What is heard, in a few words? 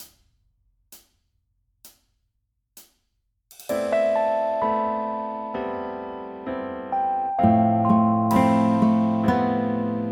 Guitar